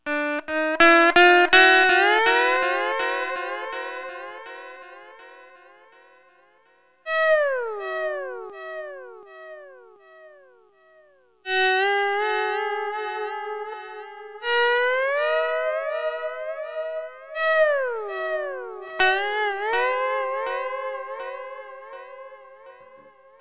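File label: Sunrise Guitar Fx A#, Lounge; Zero-G Kocktail Kollection; Construction Kitz (Lounge); Sunrise Mixes 082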